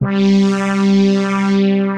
Retro Century Strings, Synth Pad; Ableton; Creative Extensions; Racks

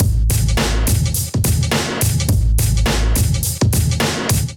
Groove 105 Bpm, Drums; MIDI Clips; Safe House; Ableton; Factory Packs; Chop and Swing

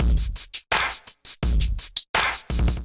Head Smack Kit groove 84 bpm, Drum Essentials; MIDI Clips; Ableton

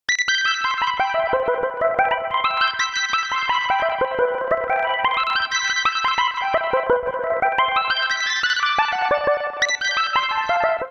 Smooth It Out Lead E Minor 90bpm; MIDI Clips; Melodic; Ableton; Factory Packs; Chop and Swing

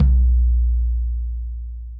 FLOORTOM finger; FLOORTOM PL 1816 finger; Ableton; Factory Packs; Drum Booth

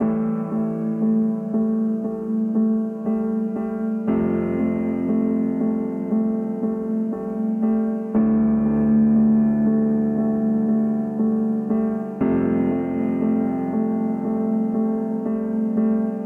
Epic Piano - Amin 118 bpm; MIDI Clips; Tonal; Motifs; Ableton; Factory Packs; Mood Reel

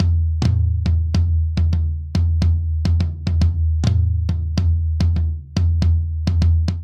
Swing Jazz Toms Only 140 bpm; MIDI Clips; Ableton; Factory Packs; Drum Booth

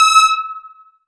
Release E6, Analog Synths; Ableton Foundation FreePacks

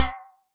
Pluck Funk Touch, Guitar & Plucked; Ableton; Glitch and Wash

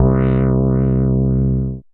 Organ Bass; Drive and Glow; Ableton; Factory Packs

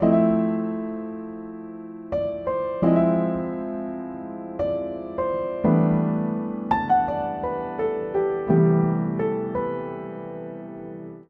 Phrase IV-iv-iii-I C Major 85 bpm, Upright Piano; Last Dance; Ableton; Factory Packs; Upright Piano by Spitfire Audio

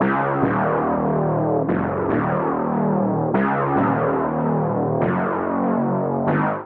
Pad F# Major 144 bpm, Bassline; MIDI Clips; Tonal; Ovina; Skitter and Step; Ableton Factory Packs

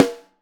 Snare Top

